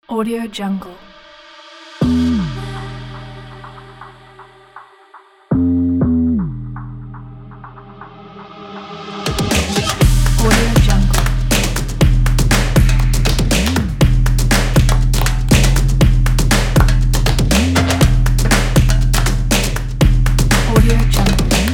sport percussion

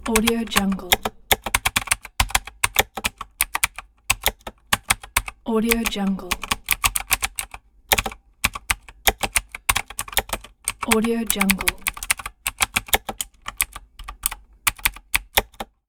keyboard typing

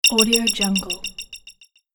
videogame hit ding repeat

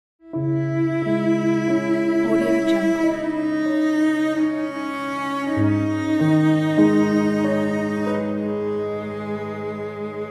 piano nostalgic

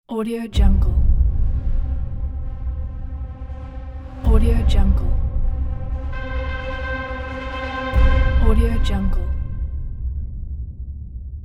trailer horns logo

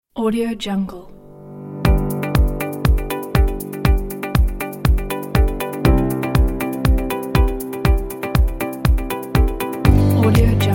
acoustic upbeat happy inspiration

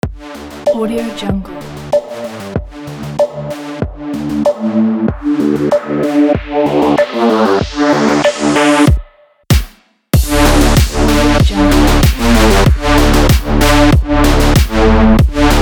sport cyberpunk; effect butterfly

